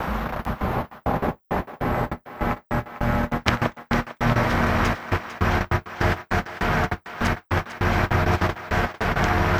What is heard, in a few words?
Envelope Beat